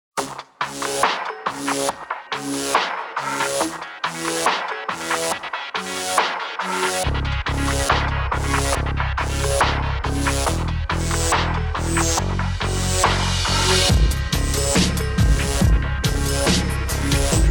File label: Electric Trip